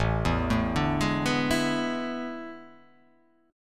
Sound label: A Major 7th Suspended 2nd Suspended 4th